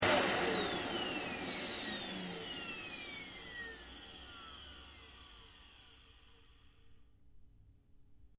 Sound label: Vacuum off, Vacuum (Domestic): Switched Off; Appliances; Household; Stuart Duffield